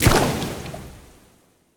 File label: Water Tank Footstep; AudioWizards